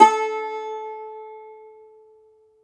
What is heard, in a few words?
Cumbus SAZ A, Plucked Strings; E-MU Producer Series Vol. 7 – Old World Instruments